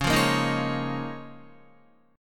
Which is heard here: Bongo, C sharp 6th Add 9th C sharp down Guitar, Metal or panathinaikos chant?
C sharp 6th Add 9th C sharp down Guitar